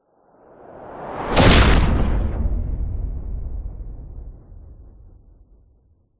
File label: science fiction impact explosion spacecraft blow up missile; zapsplat